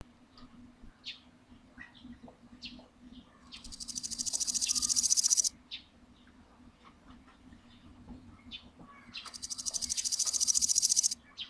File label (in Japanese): nakiinago